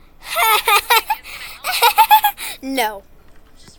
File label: hahahaha no